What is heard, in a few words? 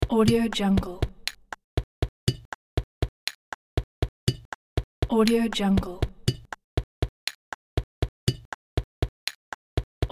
found sound drumming